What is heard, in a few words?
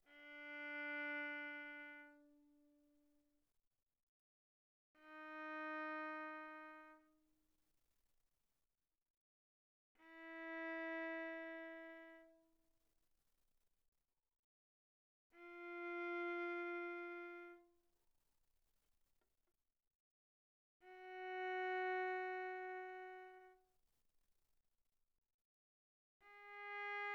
Viola arco sul D pp D4 B4